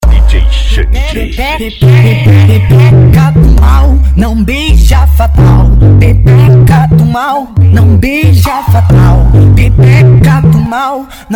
funk bass

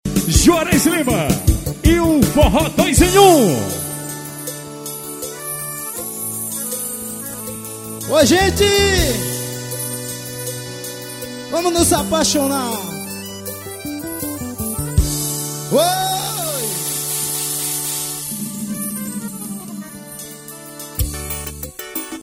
forro ao vivo

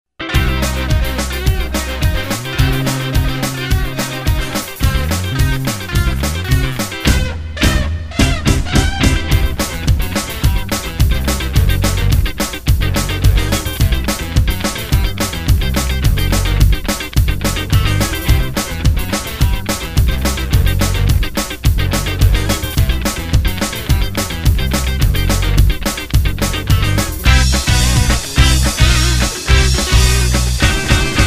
instrumental

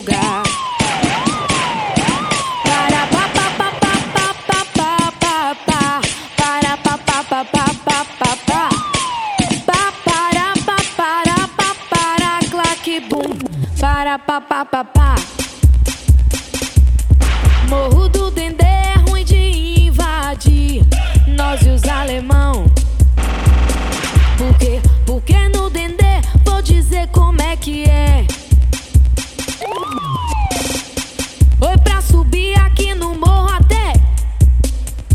rap

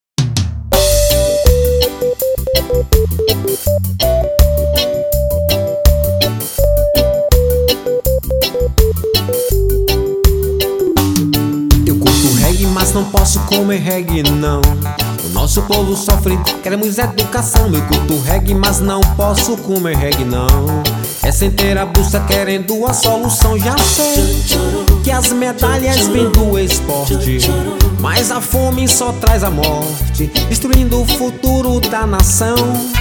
reggae

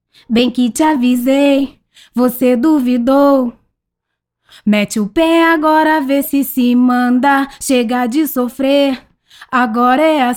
acapella melody

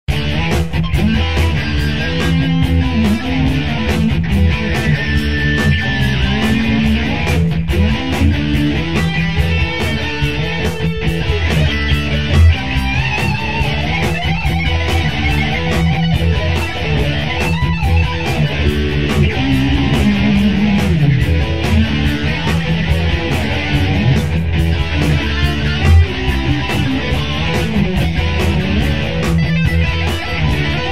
rock

